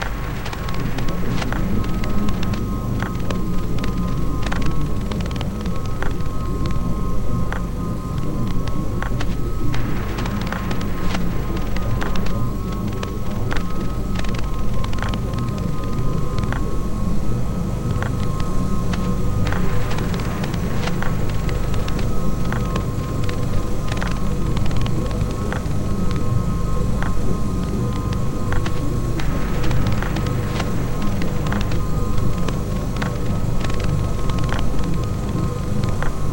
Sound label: old tape